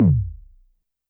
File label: Tom Synth, YAMAHA RM1X